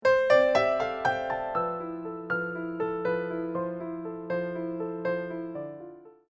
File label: Piano; Son de Piano N°2